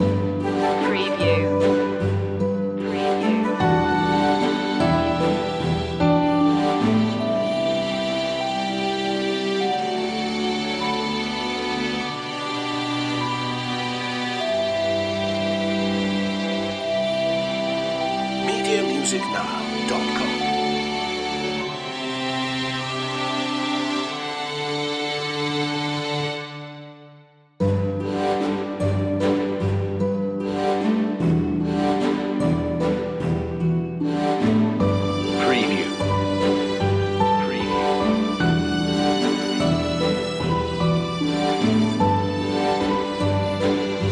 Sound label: regal waltz